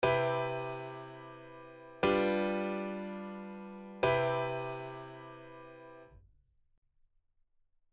cmaj7 g7 cmaj7

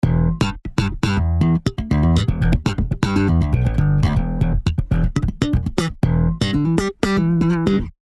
bass after

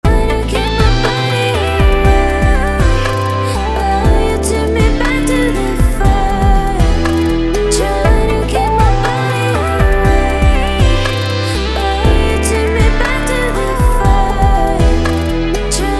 Synth Analog; Komplete

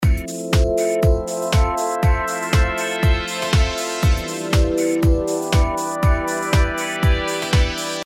synth recipe

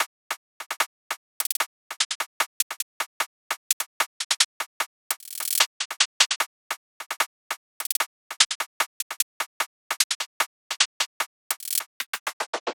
Drill Hats Delay Before

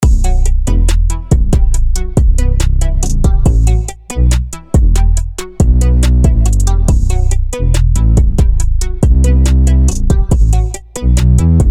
Low Lifter On

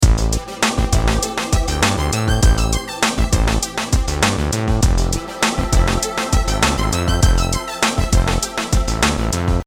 chord korg gadget

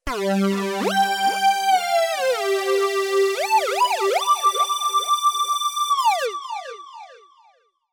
lead

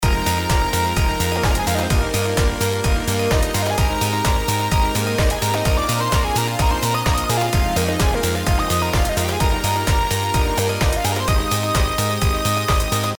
lead

